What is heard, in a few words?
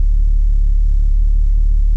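Ambience Sci-fi Energy Loop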